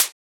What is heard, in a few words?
Closed Hat; FLPRO